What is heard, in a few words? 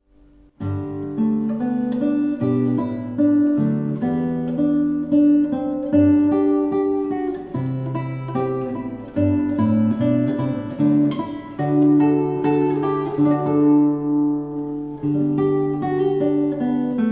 lullaby